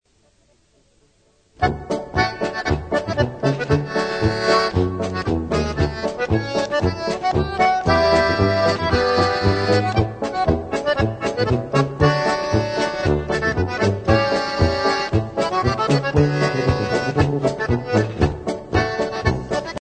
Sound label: SHARPSHOOTERS MARCH